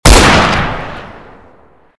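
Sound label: obrez fire, sawed-off shotgun fire; STALZONE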